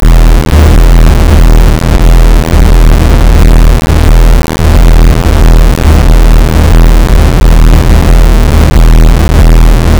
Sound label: cinematic tension pulse, underscore